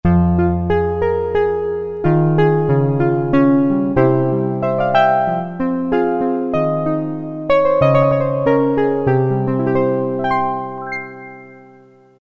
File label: Elec Piano 4, Roland MT-32